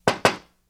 Knocks On Glass; Light Knocking On Glass Door. - Knocking On Glass